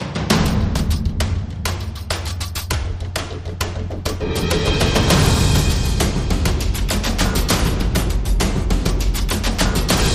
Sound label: Drums and SFX